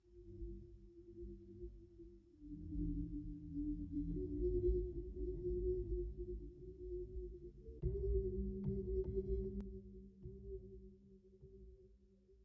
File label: pulse cue mono; cstrike; communityblock 2020